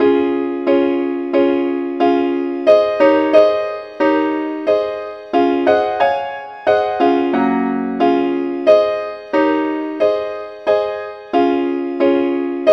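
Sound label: simple acc, key C sharp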